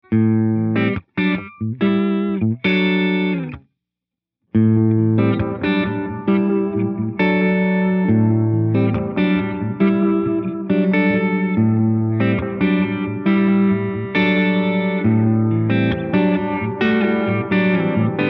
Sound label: Delay Filtered Feedback Lo Pass